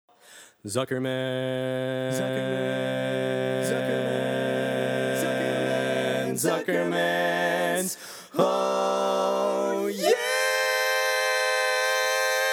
Bass, barbershop

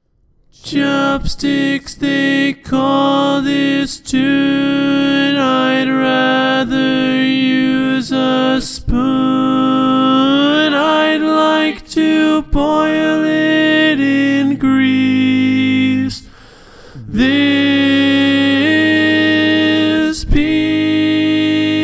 Tenor, barbershop

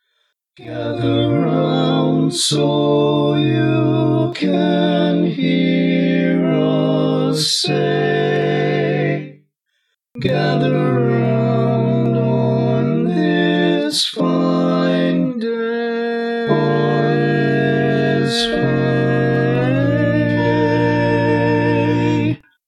All Parts, barbershop tags

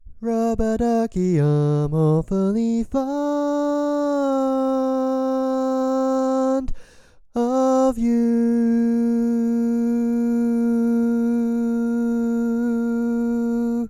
Lead, barbershop

